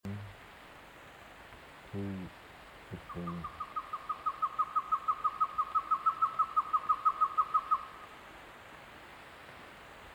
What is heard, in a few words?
solo scale